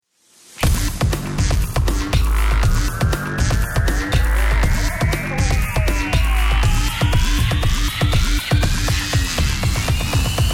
round ten sec count, music

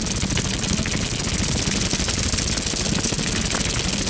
loop, antlion wings; creatures

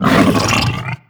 pull grunt, barnacle creatures; Half Life Alyx

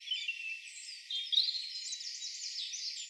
birds 1shot; animal

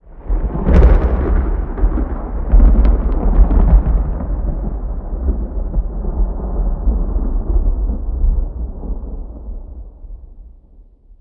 thunder close, rainscapes; ambient; ambience

